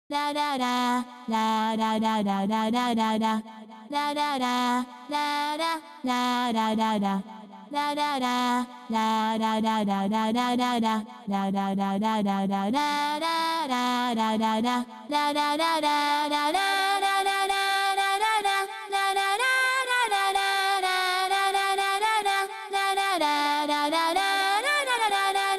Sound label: vocal main stem